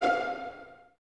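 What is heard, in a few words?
violins F5 pizzicato, String Ensembles; Miroslav Vitous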